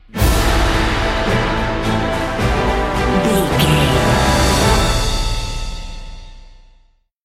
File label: march epic cinematic sting bumper